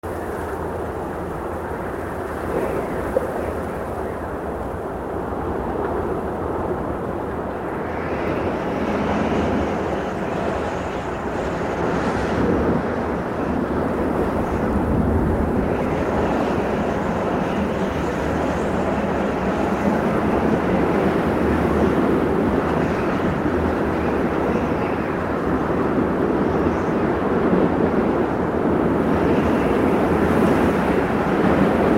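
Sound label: sky sounds; zvuki neba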